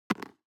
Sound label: DICE on Cardboard, Throw and Roll, Metal, 1 One Die; Samples; ArticulatedSounds; DICE Sfx Party (Extended)